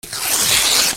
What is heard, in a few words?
PAPER